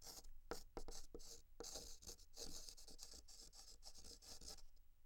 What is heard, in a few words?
Chalk On Chalkboard; Fairlight Sound Lib